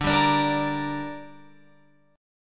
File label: D Suspended 4th Sharp 5th D down Guitar Open F, chord; strummed